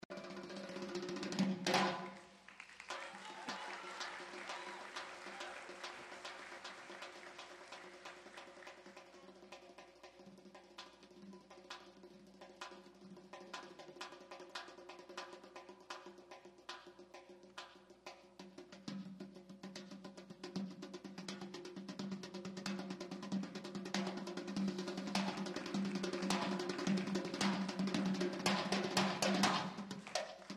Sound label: dhol